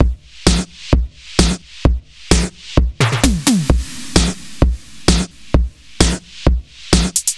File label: Beat Quicken; Zero-G; Future Wave; Beats